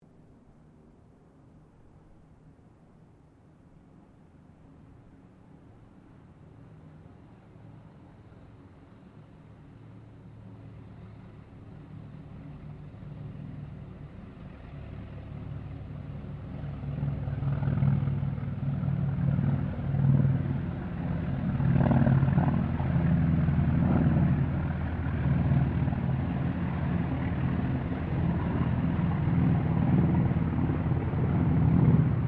AIRCRAFT TROJAN SQUADRON 4 PASS DISTANT

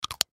Inhaler Replace Cap; Lids; Foley; Lid Sound Effects; Objects; Blastwave

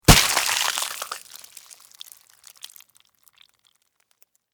Human Blood; Blood and Guts; Horror; BlastWave

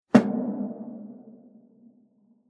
Single Dramatic Hit, impact; Explosion

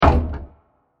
Wood Hit With Reverb; Blasts; Impacts; Crafted Signals

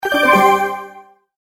multimedia tone alert; Tones (Sound Effects:Sound Design); McKinney Sound

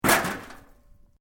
METAL LIGHT CRASH; Materials

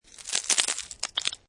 Snow And Ice Bits Of Ice Falling On Ice; Ice Sound Effects; Weather

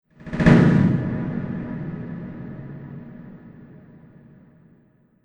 Movie Trailer Awesome Whoosh

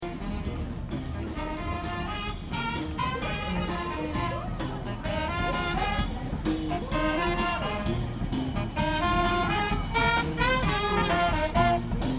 Ambience Melbourne Moomba Parade Jazz Group; Moomba festival Melbourne 2009; Parade Sound Effects; Foundation1

